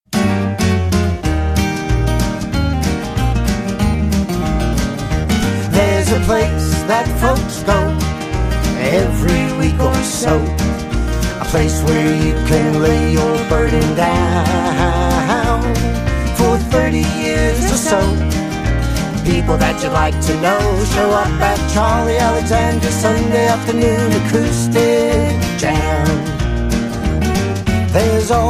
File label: Sunday Afternoon Acoustic Jam